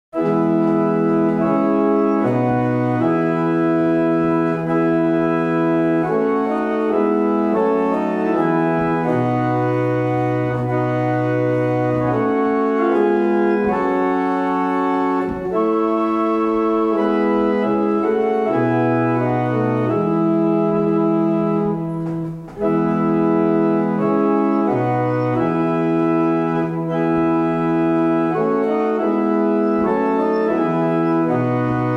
Double chant, barrel organ; Muchelney

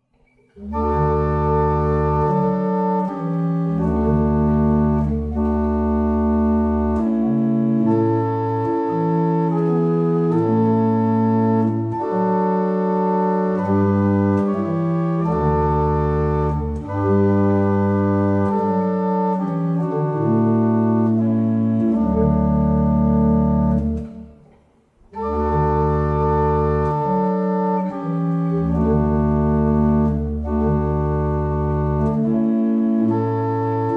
Double chant, barrel organs; Staunton

